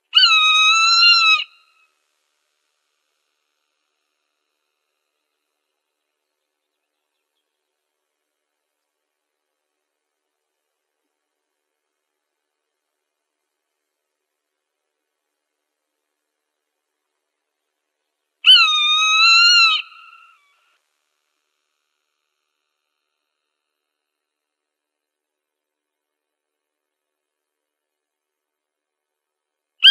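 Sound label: Swainson's Hawk, Birds of Prey; SWHA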